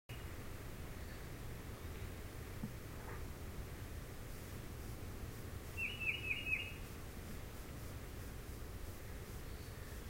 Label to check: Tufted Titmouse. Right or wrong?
Right